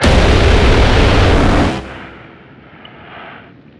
brimstone lazer big

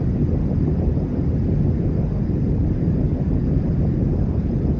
portal entity loop

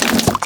meat head shoot

